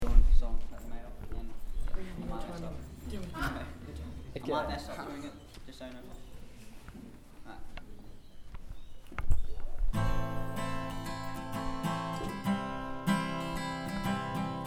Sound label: Group live